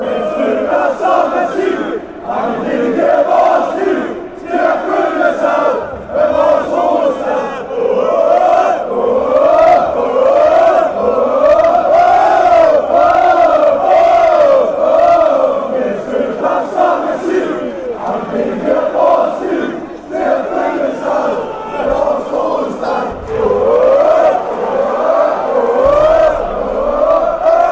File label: fc copenhagen chant